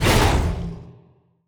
attack basic cast; bloom minigame

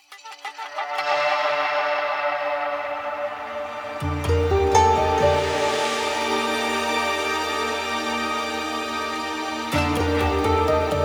Cutscene 84BPM

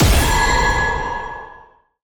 dark binding hit; bloom minigame

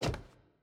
Footstep Basement Wood Stairs

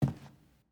Footstep Wood

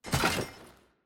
Arm Put Down

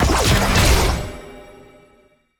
Attack Heavy; bloom minigame

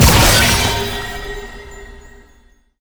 minigame SFX assist; Kayle; bloom minigame

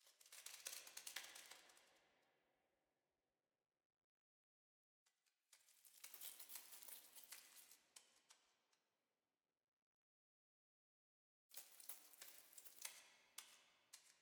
Roof Spiders

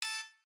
Turret Button

